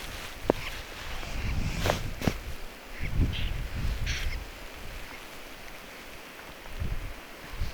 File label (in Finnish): jankakurpan aanet toinen on ilm suippopyrstokurppalintu